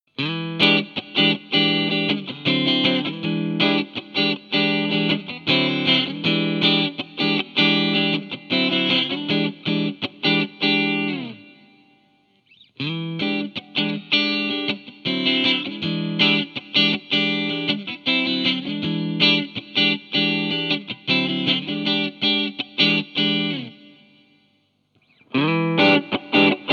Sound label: joyo dc15 amp model; Roland Jazz Chorus; Wave-X C-Clean; Ibanez TS9; MI Audio Crunch Box; Wave-X Power Drive - Lead